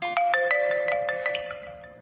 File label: Vivo Original Message Ringtone; ringtones